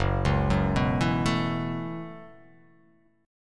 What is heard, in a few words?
Gb Minor 11th Gb down; Gbm11; arpeggiated; JGuitar